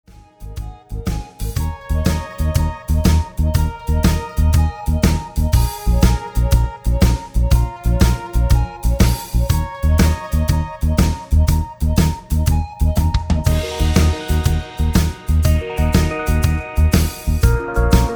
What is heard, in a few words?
No Guitar